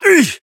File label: pain big; Operative